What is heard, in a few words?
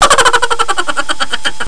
hehehei